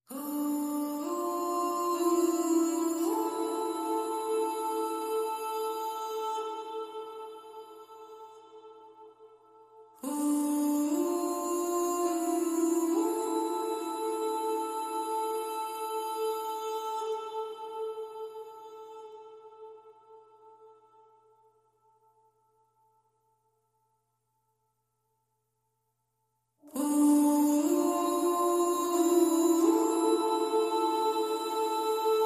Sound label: Vocal Only